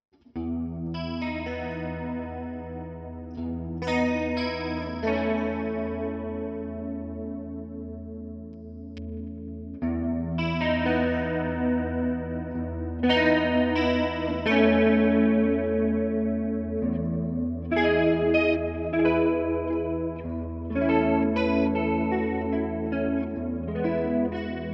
Clean

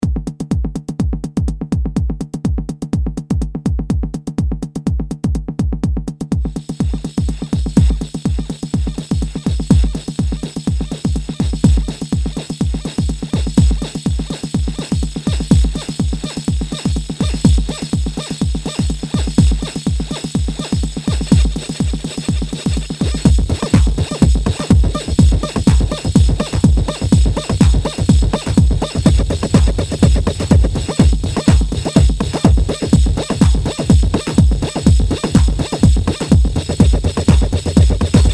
TR-909; MetaVox

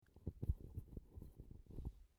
Hand DPA d_facto II

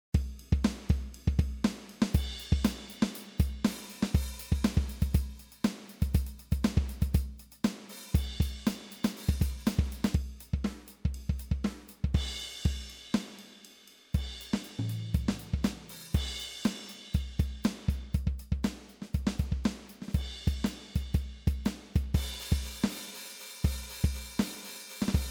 Drummer Rock Pattern Stereo Out; MOOER

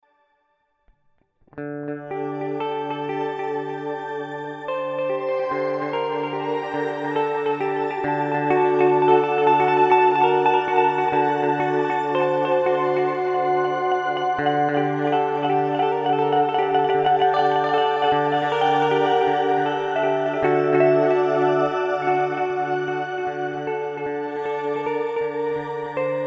Boss DD 200 Shimmer

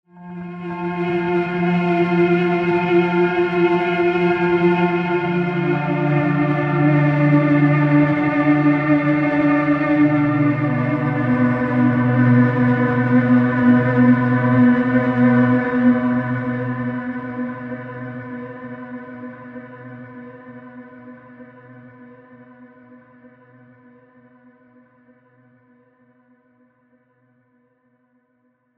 Scoring Guitars Pads

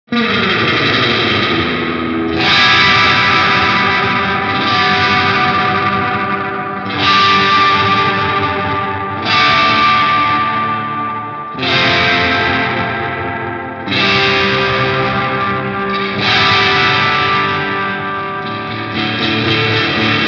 Surfybear Classic Reverb crunch; Surfy Industries

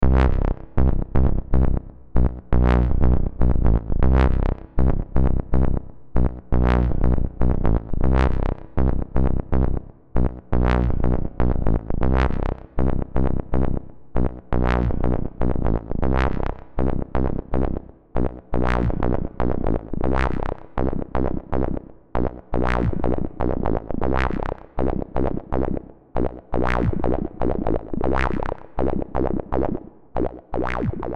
Acid bass

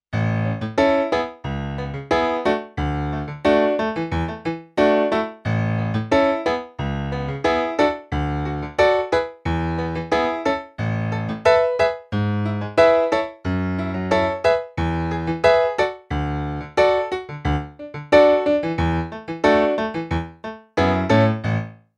Grand Piano XXL Piano

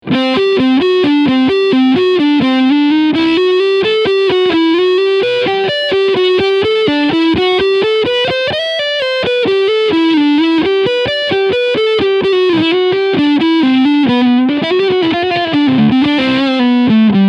FGN Neo Classic LC20 Lead Sound Neck Humbucker

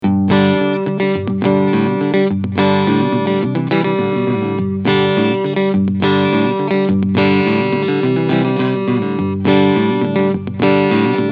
Fender SQ Bullet Tele Cleansound Front PU

